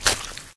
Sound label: player slosh